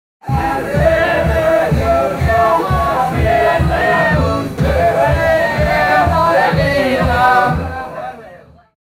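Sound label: folk song bar entertainment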